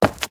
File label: Stone Run